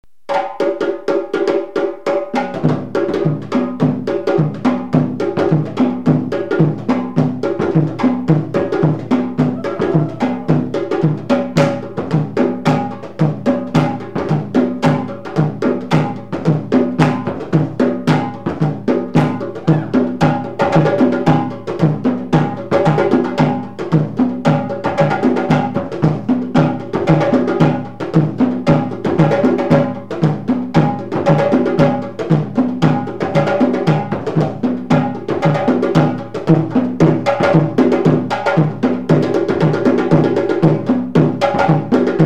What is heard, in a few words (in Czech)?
BUBNY K TANCENI; 1x choreografie a zaver